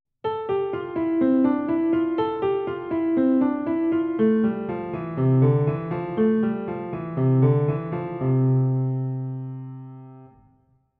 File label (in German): Spreizen der Finger Übung